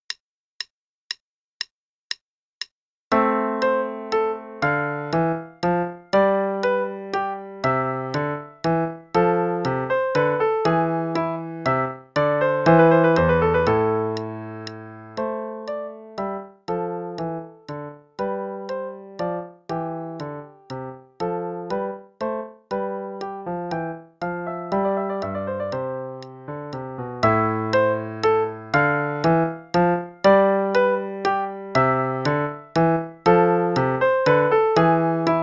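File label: Play-a-long, Minuet in A Minor, quarter note=120; Krieger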